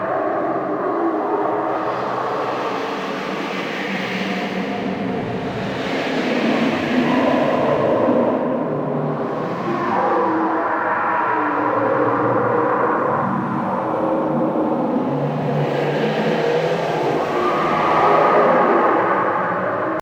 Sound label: Settlement Interior Cave Ambience; Warframe; Grineer Settlement